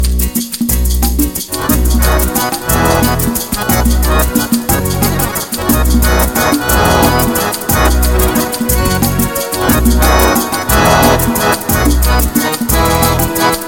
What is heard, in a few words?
Hot Fast and Pretty